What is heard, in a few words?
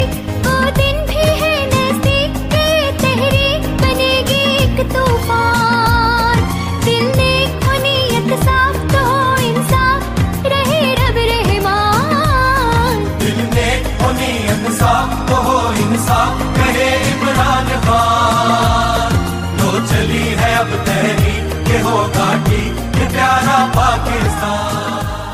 anthem ringtone; pti